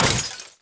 Pott Clps Sm; objects; Star Wars Obi-Wan; XBOX

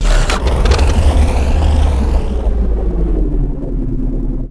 start, ships; Quantum Redshift; kumiko

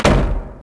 Door wooden close; Max Payne

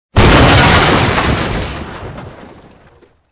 crash; Soldier Of Fortune II Double Helix; XBOX

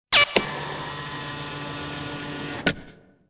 door; Soldier Of Fortune II Double Helix; XBOX

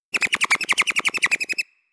Chipmunk; Animals; XBOX; BassProShopsTrophyHunter2007

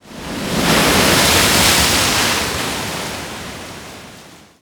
Waves Heavy, ambient; Totaled; XBOX

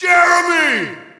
Shout, audience; UFC Tapout 2

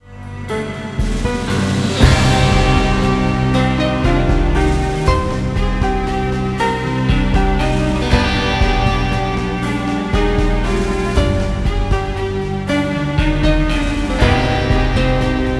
theme short, music; Max Payne; XBOX

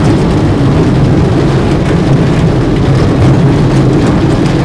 Sewer Wheel; Finding Nemo; XBOX

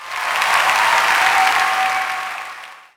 Cheer, Crowd, reactions

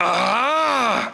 arrrr

